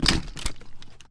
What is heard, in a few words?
Weapon Appear; zps